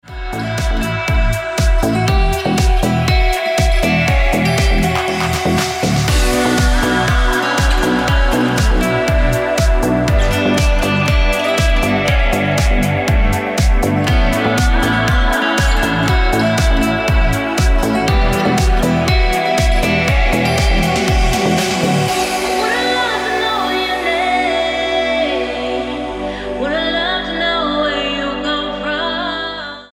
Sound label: Ringtone